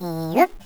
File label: hop; demoscene; worms vbi